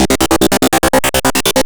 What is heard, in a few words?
GRUNGE